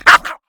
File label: wolfman grunt; LoL; monsters